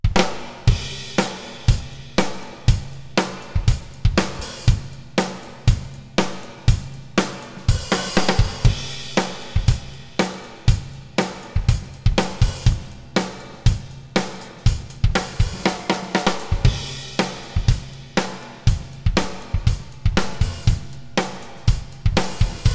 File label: drums medium hall jazz room light